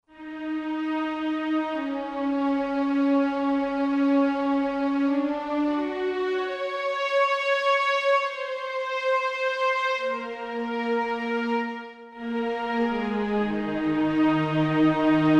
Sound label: St Str Orc p-f, Roland SR-JV80-16 Orchestral II